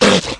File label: Large Spear Bark